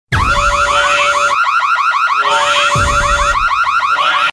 Ambulance

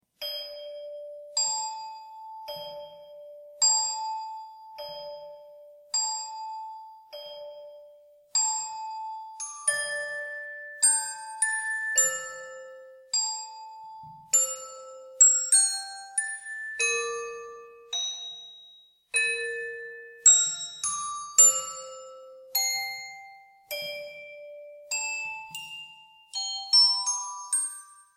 Music Box

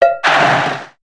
Football score

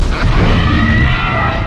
Velociraptor

